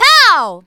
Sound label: pow; HeavenStudioPlus; karateman